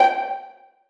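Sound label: violas pizzicato f#3; Sonatina Symphonic Orchestra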